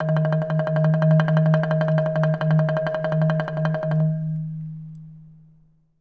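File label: TREMOLO D#5, Orchestra; Best Service; Peter Siedlaczek's Advanced Orchestra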